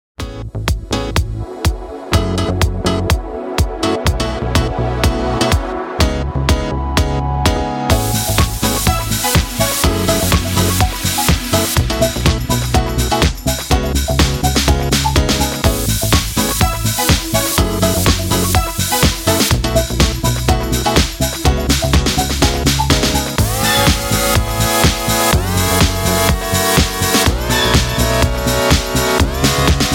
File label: Velvet elektrosteps electro boost